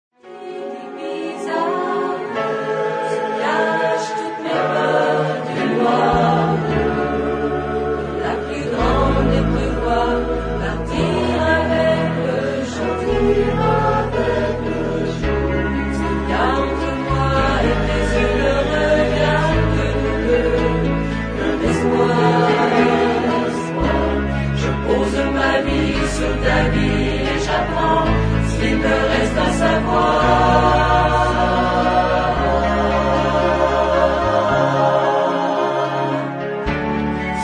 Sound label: SATB